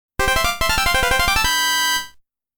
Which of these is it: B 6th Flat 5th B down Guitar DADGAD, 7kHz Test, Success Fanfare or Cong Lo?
Success Fanfare